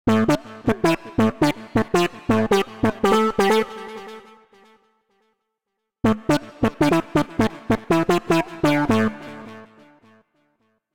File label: Horn D Minor 88bpm; MIDI Clips; Melodic; Light; Ableton; Factory Packs; Chop and Swing